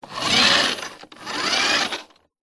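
leisure radio controlled car off push carpet short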